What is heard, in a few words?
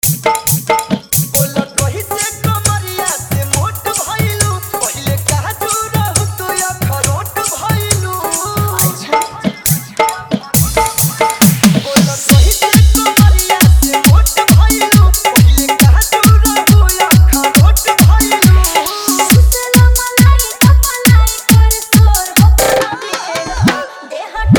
New Bhojpuri Trending Fully Dhollki Bass Mix